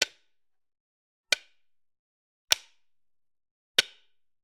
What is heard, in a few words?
Generic Button Wood Tiny; boom library; The Interface